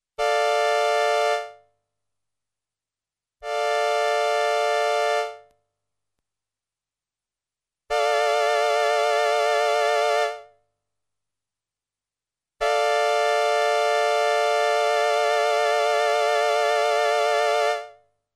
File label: STRINGS I w attack and vibrato adjustments